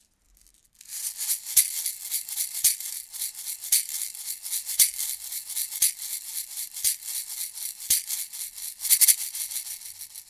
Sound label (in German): shaker; img stageline ecms-50usb test; sennheiser mk 4 digital